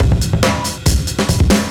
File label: Beat Style, 140bpm, breaks